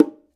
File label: BONGO; InVision Interactive; Percussion Slam; BOLERO